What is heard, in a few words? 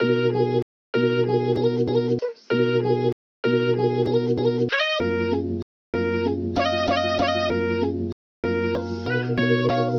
Sample Chops; modern hip-hop